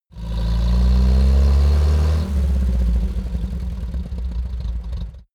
car uaz 469 short acceleration idle

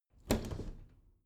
bathroom cabinet hinge scratch; objekte; tueren

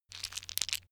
stone gravel clatter; natur-tiere; steine

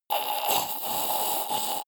monster choking zombie dying; comedy-cartoon; soundpool

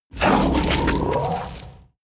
kraken growl hiss; kreaturen

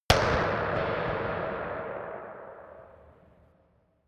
gun dark pop gun

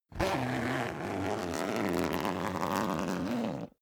zipper down jacket open slow; objekte; reissverschluss

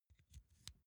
insert xlr extension slow; objekte; elektronik

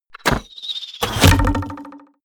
arrow hit; soundpool

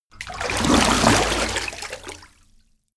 splash long; haus garten

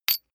medium metal impact medium chink

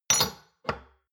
shot glasses stack medium; soundpool

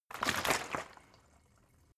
bottle water big upend; berufe

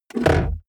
car bmw e46 interior center console open

